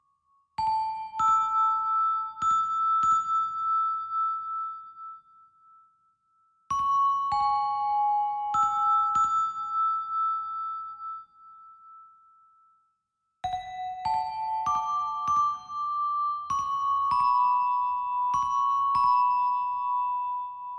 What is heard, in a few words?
Music Box; Azure Sea